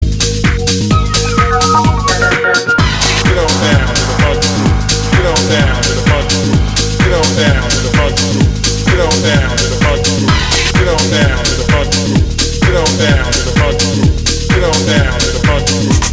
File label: Minimal Funk